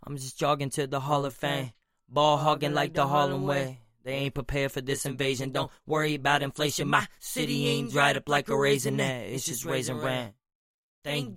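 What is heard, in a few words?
hip hop 87 D minor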